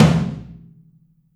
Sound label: Drums Percussion Kit1 Room Mic